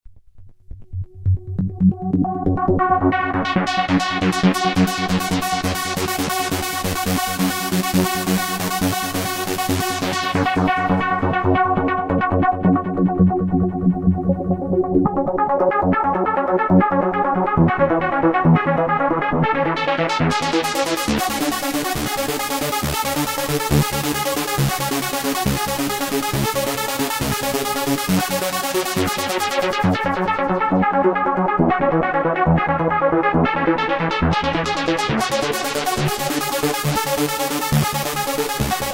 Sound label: TRANCE, Korg microKORG; Factory Demo Songs